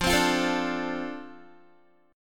F 6th F down Guitar; F6 strummed

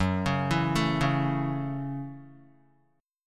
F sharp Minor 7th F sharp, Guitar; arpeggiated; JGuitar

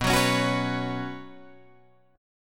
B Suspended 2nd, Guitar, Drop D

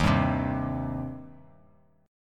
Eb 6th Eb down; Eb6 strummed; JGuitar

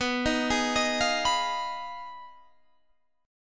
B 6th Flat 5th B down Guitar DADGAD

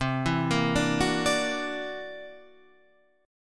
C 7th Add 9th Sharp 11th C, chord

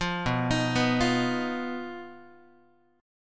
B Major Flat 5th B down Guitar; BMb5; arpeggiated

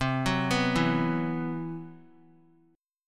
C Major 7th Flat 5th down Guitar; CM7b5 arpeggiated; JGuitar

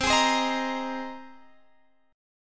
C Minor 7th Sharp 5th C down Guitar Standard; strummed; JGuitar

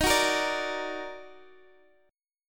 Eb Minor Major 7th, Guitar Drop D

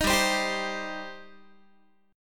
G Suspended 4th Sharp 5th, Guitar; Gsus4#5 strummed; 18,15,15,17,16,x

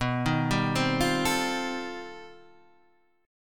C Minor Major 13th B

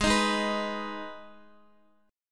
Ab Minor Ab Guitar; Abm strummed; JGuitar

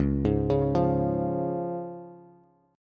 C sharp Major, Bass; arpeggiated; JGuitar

